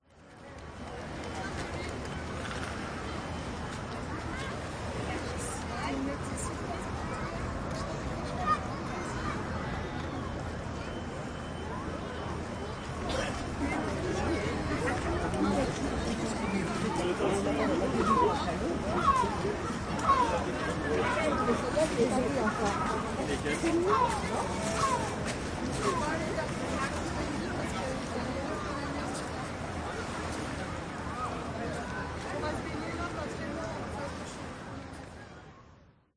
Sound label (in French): promenade plage centre ville Binaural